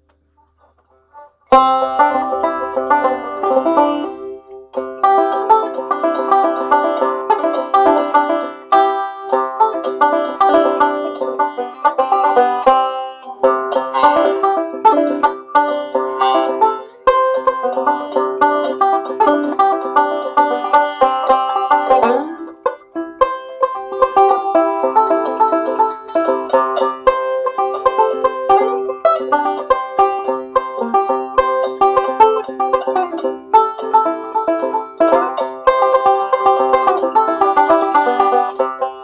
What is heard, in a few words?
picking, banjo